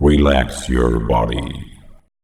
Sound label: Vocal Phrases; Vengeance Samples; Vocals